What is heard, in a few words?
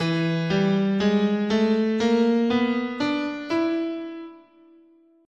E Mixo Blues, scale